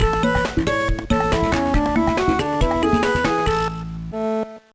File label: Level Complete; The Wild; GBA